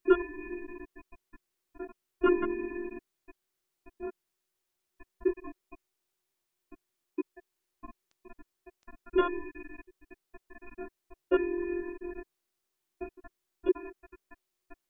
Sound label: piano 1 layer